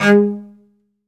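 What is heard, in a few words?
solo cello, strings; Synclavier; ILIO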